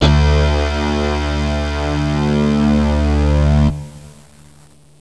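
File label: SYNTH BASS D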